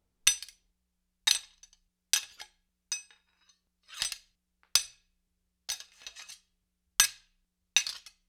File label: Spear Sabre Blade on Hilt, Weapon on Weapon; StillNorthMedia